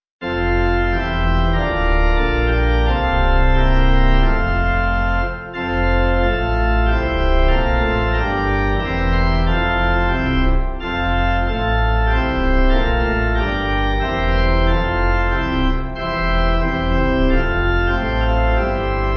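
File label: Pipe, hymn backing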